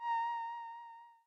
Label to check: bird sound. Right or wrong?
Wrong